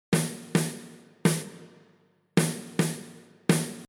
snare comp EQ; masaakiwada

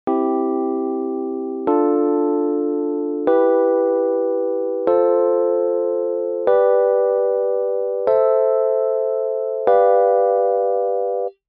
C Major; masaakiwada; Theory

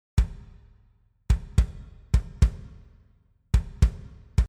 kick comp EQ; masaakiwada

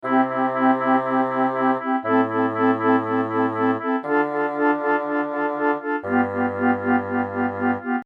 woodwind; masaakiwada

